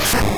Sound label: High Jump Kick; sfx; attack moves; Pokemon; gen 1; rby